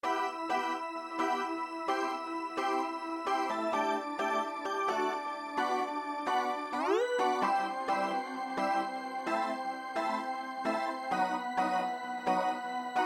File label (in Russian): gitara